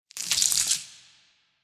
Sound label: small leg pouch down; biogenesis